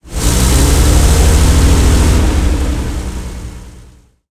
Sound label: stug40 engine load, tanks; War Thunder